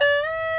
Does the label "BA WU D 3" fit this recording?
Yes